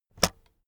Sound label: keyboard external space release heavy; kommunikation